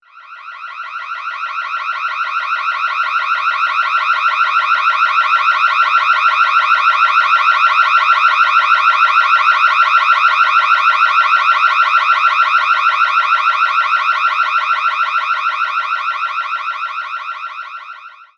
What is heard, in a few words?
Alarm Motion